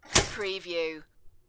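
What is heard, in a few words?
Toaster Push Down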